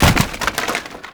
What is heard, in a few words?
Wood Crate Destroy; Warframe; NineteenNinetyNine